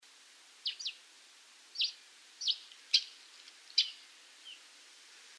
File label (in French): moineau friquet